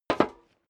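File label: step dress boots metal rattle single stair down, footsteps; schritte; menschen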